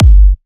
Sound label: Punchy Kick